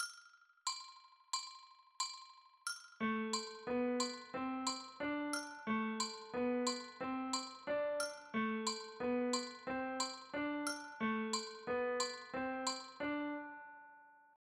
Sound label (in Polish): Teraz na "i": Z podkładem